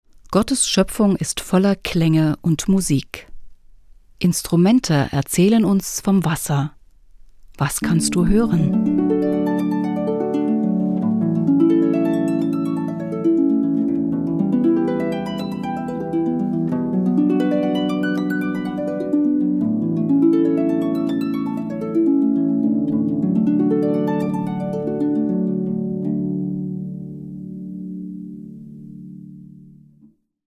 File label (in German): Instrumente erzaehlen vom Wasser